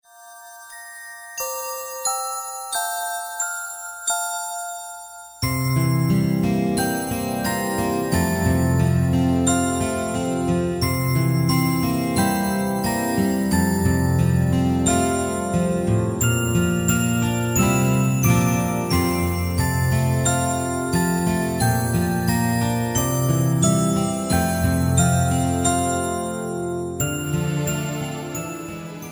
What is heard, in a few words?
bye baby bunting